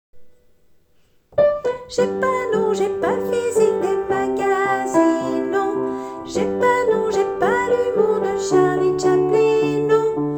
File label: tenor